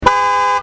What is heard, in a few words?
Ford Focus